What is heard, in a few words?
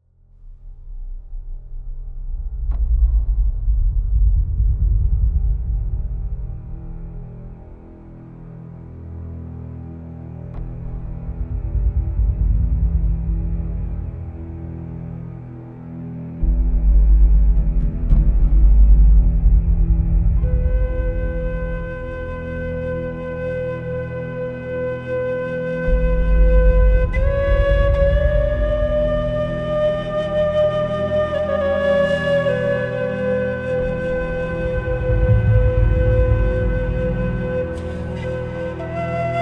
flute